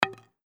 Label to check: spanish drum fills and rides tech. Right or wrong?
Wrong